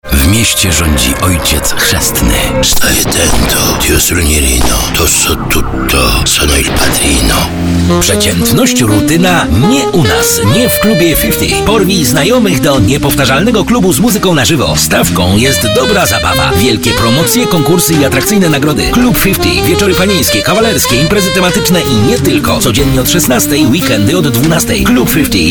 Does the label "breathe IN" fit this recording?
No